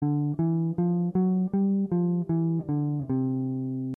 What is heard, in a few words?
bass